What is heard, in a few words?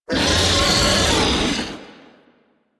Vocal Attack Short, Enemies; Warframe; Teralyst; Ropalolyst